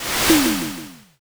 fire magic

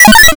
glitch

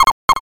frog

